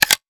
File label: weapon foley pickup; Stereo; Real Guns Sound Pack 2